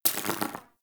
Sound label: Minigun Mech; Warframe; Weapons; Corpus